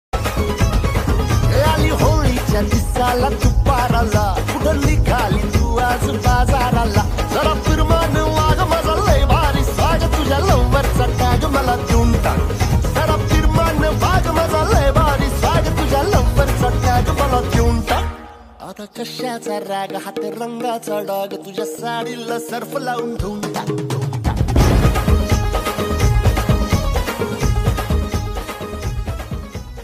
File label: Marathi Song Ringtone